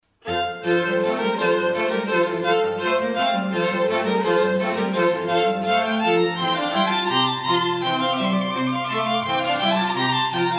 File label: strings brandenburg concerto